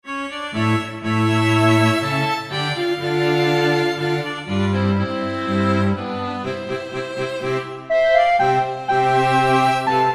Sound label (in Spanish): mozartiada con flauta